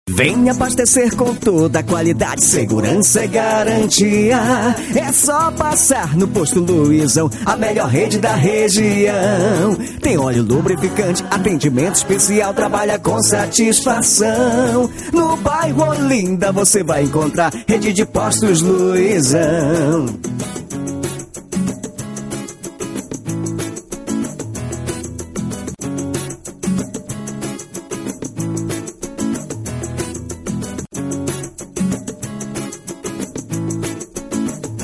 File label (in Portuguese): jingle posto